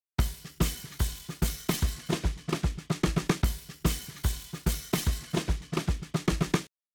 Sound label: Drums, shuffle drums; WDR2